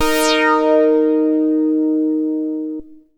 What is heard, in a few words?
MASS LEAD, SYNTH; Keith Emerson Signature Series